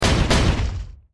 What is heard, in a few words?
skill rock